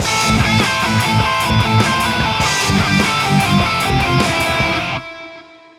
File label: polka